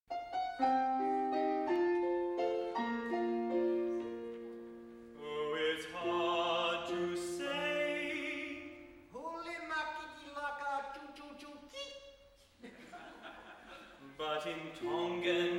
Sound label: Philological Waltz